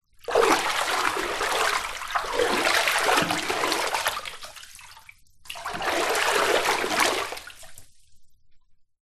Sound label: household bathtub water movements; zapsplat